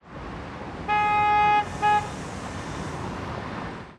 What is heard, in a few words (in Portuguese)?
Carro Buzinando em Semaforo